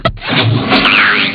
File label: tilt; 7invasores